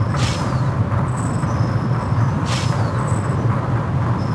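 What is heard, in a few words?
factory; Splashdown; XBOX; Soultree; Threeriv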